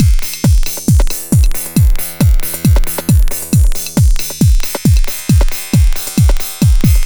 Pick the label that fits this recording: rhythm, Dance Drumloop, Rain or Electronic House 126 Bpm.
Dance Drumloop